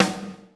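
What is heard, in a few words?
LUDWIG SNARE, ACOUSTIC KIT; Greytsounds VOL-1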